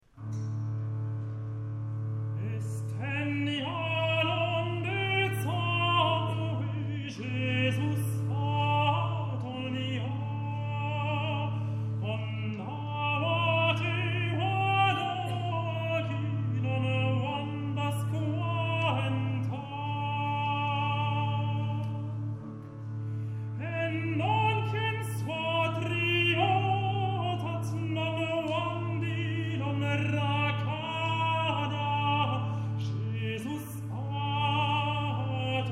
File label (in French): Messe de minuit, Une jeune pucelle; Charpentier; traditionnel